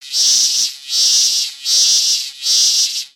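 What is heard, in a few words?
Pets Insect Large Beg; creatures; Lego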